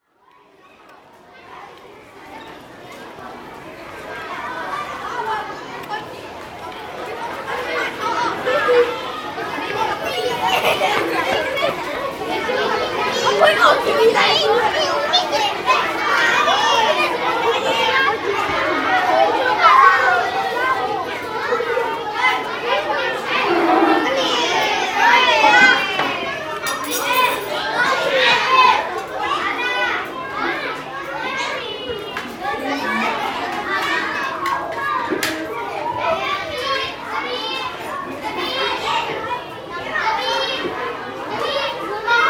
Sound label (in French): Ambiance cantine